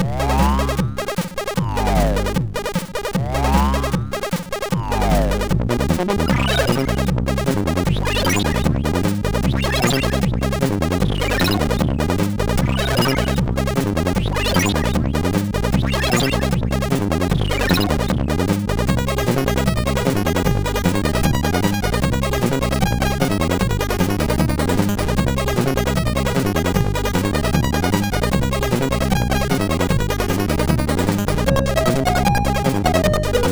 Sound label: Banjo, MOS6581R4